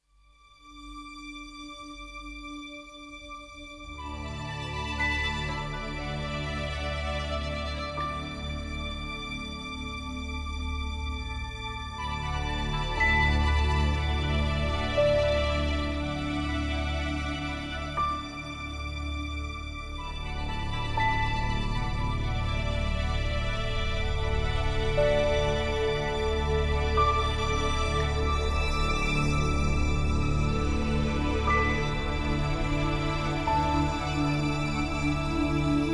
Pure Ambient

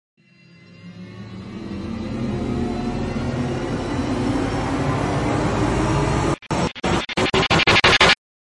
Radiance Stutter Rise Only; Ninja Tracks

